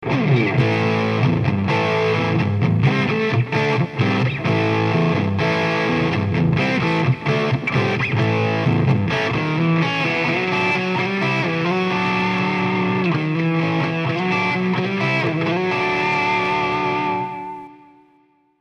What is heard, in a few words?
6v6 Rock; Plexi